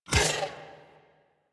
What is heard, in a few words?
Dodge Impact; Warframe; Warframes; Pacifist